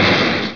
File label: metal plate hi